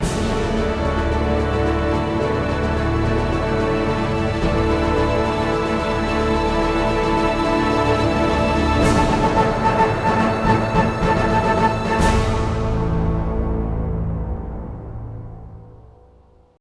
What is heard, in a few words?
victory music; mechwarrior 4 veng